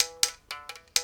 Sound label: mouth bow, Africa; Roland LCDP11 Africa VOL-1; S.MouthB Phr